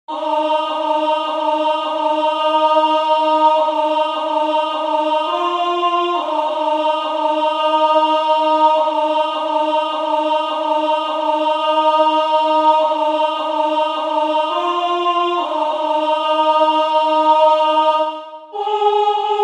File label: oi suomi katso alto